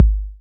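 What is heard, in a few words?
BD, bass drum; X-Static Goldmine 1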